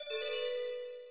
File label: magical